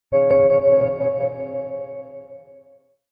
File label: Chime; Electra